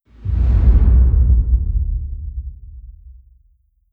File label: distance shieled fire layer; apocalypse